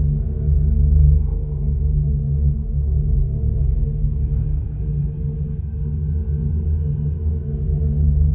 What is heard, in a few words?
MAGIC DEEP